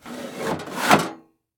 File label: done, grilling